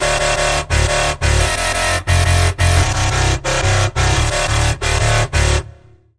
Bass - Dark, Serum 2; Bass - Dark Twilight; Routed Sound; Serum 2 Expansion - Chaos Bringer Vol.1

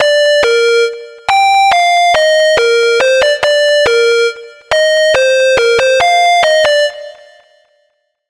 Lead; Origin Story; Routed Sound; Vital Expansion - Hyper Gamer Vol.1

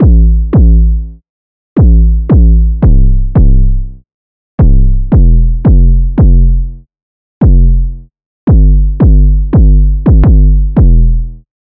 808 - Underground Host; Routed Sound; Vital Expansion - Street Vanquisher Vol.1

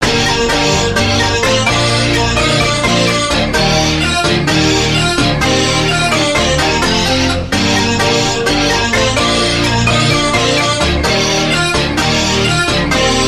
Key, Vital; Soldier; Routed Sound; Vital Expansion - Hyper Gamer Vol.1

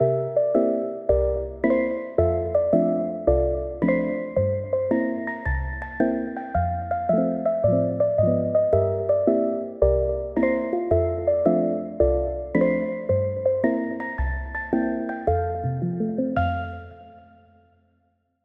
Pluck; Routed Sound; Spire Expansion - Story Walker Vol.1

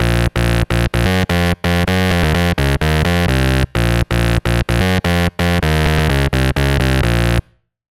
Bass; Routed Sound; Sylenth1 Expansion - Hyper Gamer Vol.1

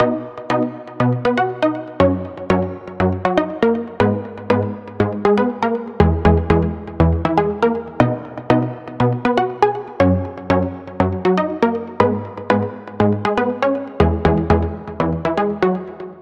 Pluck, Spire; Planetary Ring; Routed Sound; Spire Expansion; Star Scavenger Vol.1

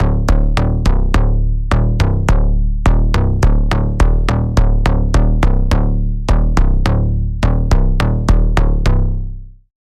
Bass; Capone; Routed Sound; Spire Expansion - Noble Gangster Vol.1

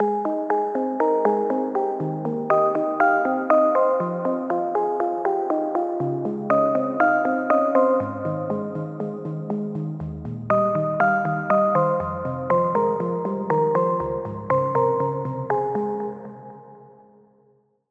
Pluck; Vital Expansion; Street Vanquisher Vol.1; Routed Sound

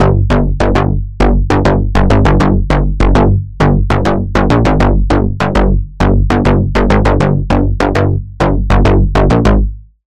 Bass; Ultra Dim; Routed Sound; Vital Expansion - Doom Carpenter Vol.1

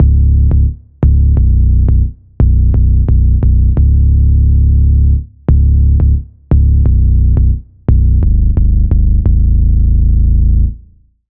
Bass, Sylenth1; Routed Sound; Sylenth1 Expansion - Flame Summoner Vol.1

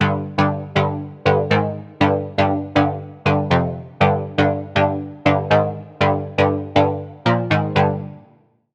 Lead; Routed Sound; Serum 2 Expansion - Noble Gangster Vol.1

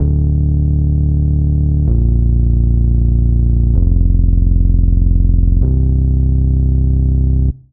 Bass, Spire; Routed Sound; Spire Expansion - Star Scavenger Vol.1

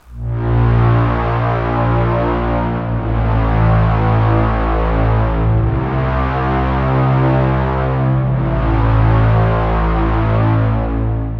Pad, Serum 2; Routed Sound; Serum 2 Expansion - Reality Maker Vol.1

